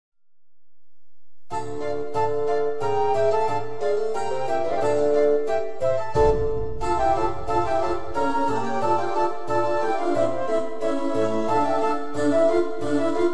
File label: instrumental